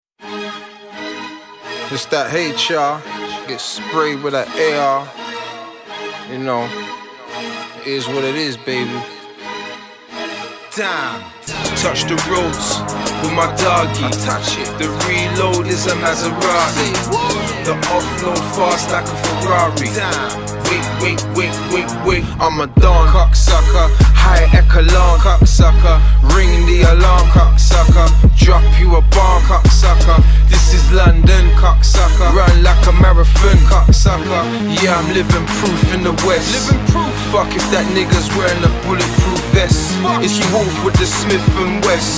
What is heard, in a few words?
Trap house Freestyle